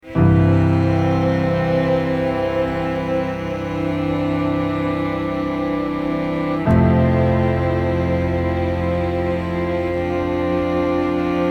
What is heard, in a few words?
Piano Strings